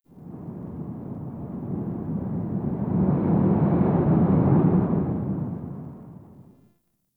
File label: Forest Half Open Cave Wind; Warframe; Grineer Forest